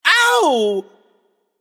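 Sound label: nonverbal ouch; smite; voicepack; merlin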